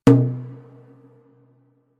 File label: Normal Spin; Shengxiao